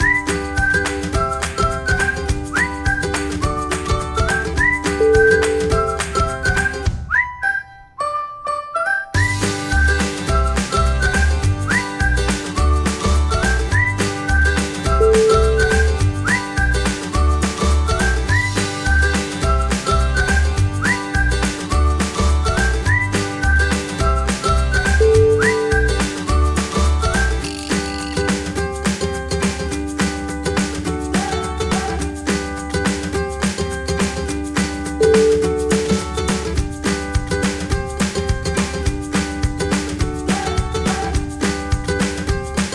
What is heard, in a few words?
fun and happy whistle